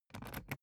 briefcase plastic latch lock key insert; industrie wirtschaft; bueroarbeit

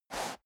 step boots sand slide short

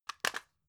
drug package drop; arzt

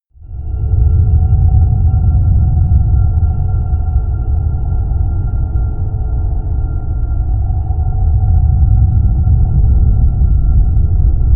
drone ghost

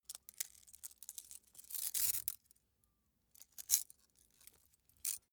small metal handling jingle long

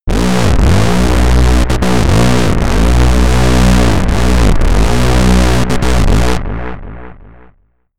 tumble and rise again low

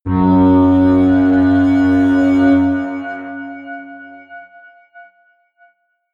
atmospheric feedback synthesizer low, drones

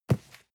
cardboard box medium grab; objekte

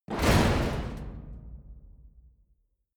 metal long; industrie wirtschaft

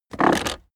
car accessories armrest open

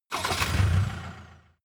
pickup start engine

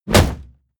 hit shield wood; hits impacts

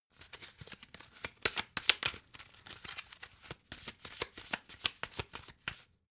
playing cards handle shuffle; comedy-cartoon; spielzeug

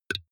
burp very short; koerpergeraeusche; menschen; soundpool

